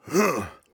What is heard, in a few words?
Grunt Attack; BombKing